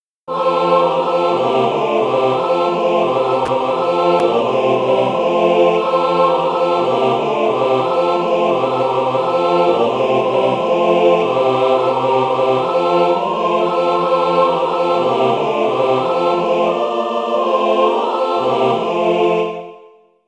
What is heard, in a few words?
Deck the Hall aah